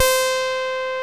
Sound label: TEISCO; Masterbits; Vintage Voltage